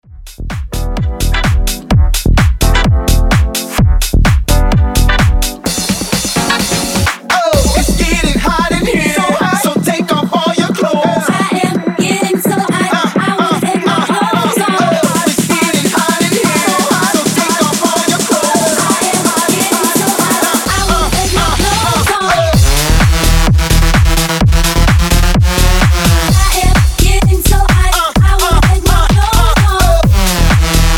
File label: tech house 128 bpm